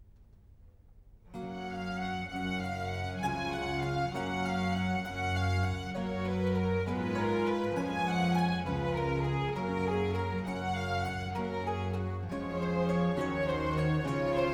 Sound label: Concerto in C major 'con molti stromenti', RV 558 - II.Andante molto; Vivaldi